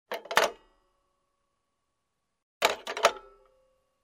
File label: Telephone receiver is picked up, hung up